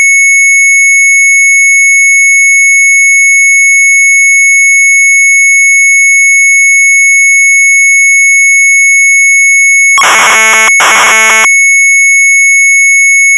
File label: MZ800 Tape; BASICODE 2 3-700; sharp; retromelsarchive